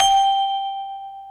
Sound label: normal slider tick